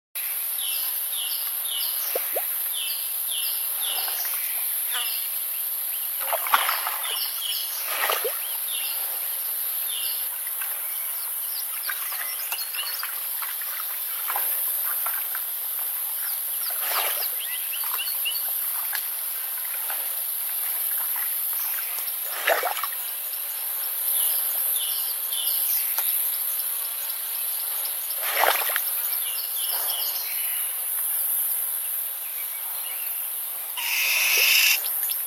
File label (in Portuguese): canto do pica boi de bico vermelho